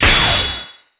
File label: Enemy Shield Guard Break; disney star smash android ios mobile gamerip 2020